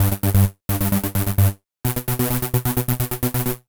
Synths, Underground UK House & Electro

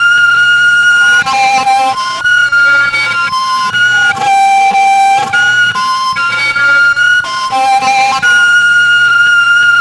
SLO MAYHEM, AMB. FEEDBACK; Spectrasonic Distorted Reality 1

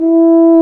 BRS F TUBA; Roland L-CDX-03

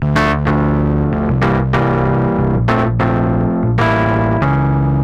Organ, 95bpm, D, analogue circuit; musicradar

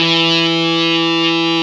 GTR Fuzz, Dirty; Club-50 - Foundations Roland

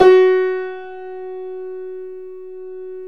PIA GRND, Roland

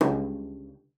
C#2, CELLO FINGER; Xsample VOL-5

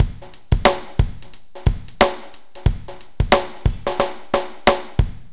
BPM 90+AMB; AKAI S-Series CD-ROM Sound Library VOL-4

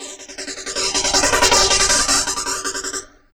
LOOPS

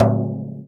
FRAME, INDIAN PERC; Big Fish Audio - Didgeridoo and Other Primitive Instruments

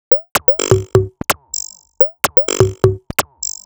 Trigger 127bpm, House Drumloops; 90 s Sample CDs; Zero-G House Fabrik Drumloops; ZG Drumloops

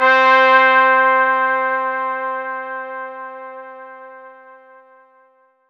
C5, Synth Line; 90 s Sample CDs; NiceBeats; SynthLine VOL-24

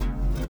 LIQUITAR; Spectrasonics; Metamorphosis

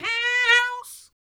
VOX, VOCALS; Ueberschall; Jam Box

